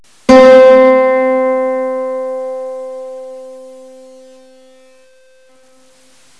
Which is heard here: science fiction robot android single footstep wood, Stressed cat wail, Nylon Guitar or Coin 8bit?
Nylon Guitar